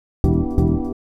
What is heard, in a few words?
house synth 125 A, keys